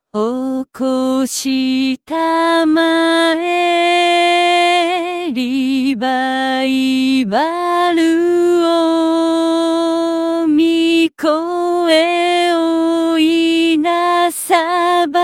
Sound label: vcl alto; easter